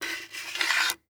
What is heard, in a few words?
Coins Sliding On Wood Table; Foley